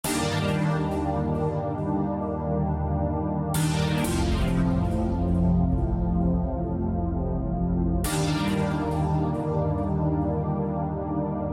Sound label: music dance